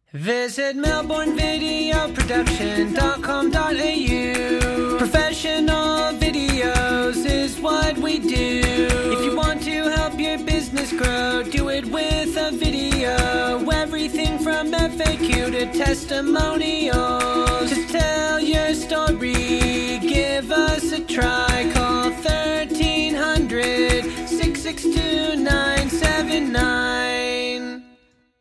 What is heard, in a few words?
jingle